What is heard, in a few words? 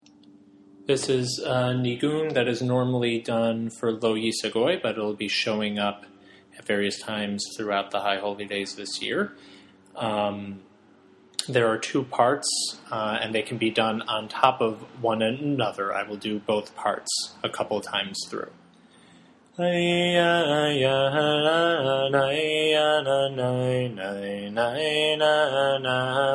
Lo Yisa Goy Niggun